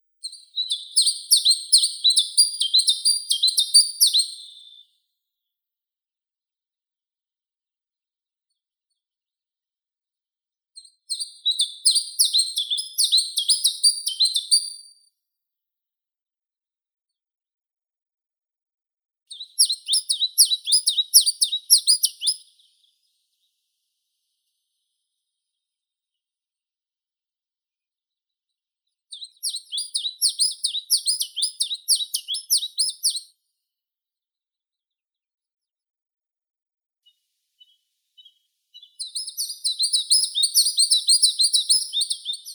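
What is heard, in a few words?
chiffchaff, Phylloscopus collybita; penochka tenkovka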